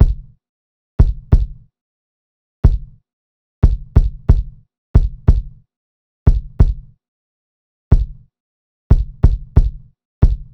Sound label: Drum Loop - 91 BPM Kick, Boom Bap; Dreamers; Cymatics - ANALOG EVOLUTION Beta